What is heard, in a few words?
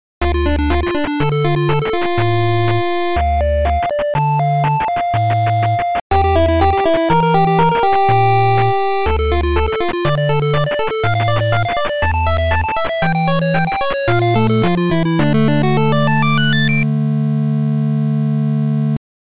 Ocarina, 8bit